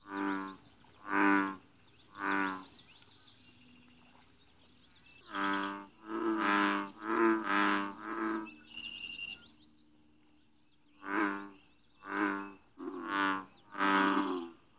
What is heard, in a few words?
Bullfrog